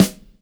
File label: tight lows snare g key, Royality free steel snare drum sound tuned to the G note. Loudest frequency: 1106Hz